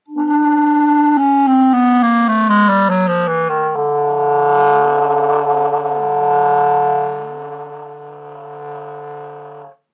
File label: singing and flutter